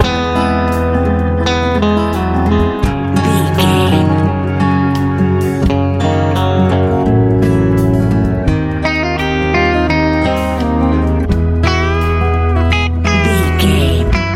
good sunny easy listening

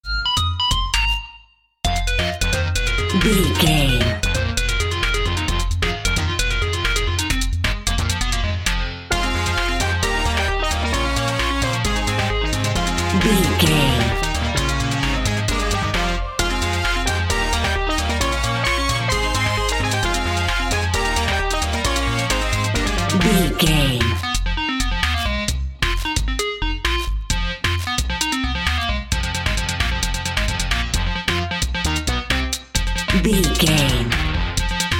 old school video game

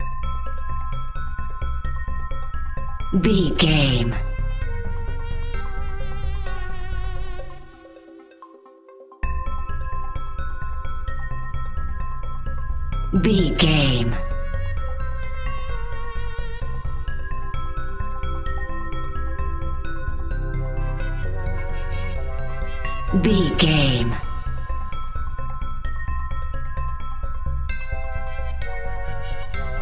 80s synth sci fi

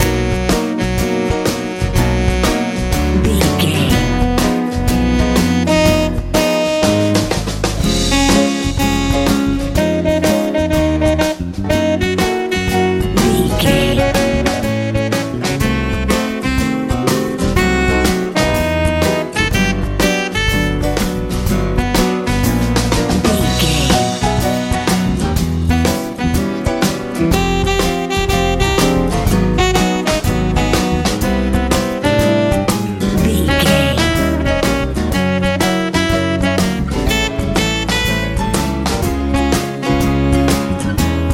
jazz

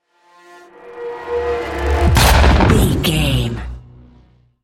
horror whoosh hit

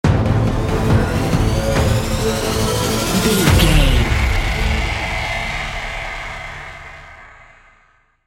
shocking development sting

